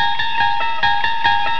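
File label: electric explosion; halloween killbox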